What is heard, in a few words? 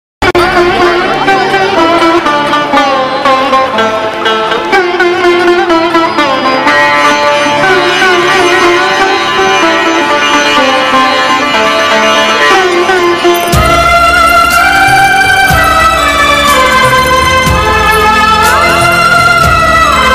mann film ki ringtone bgm sad; amir khan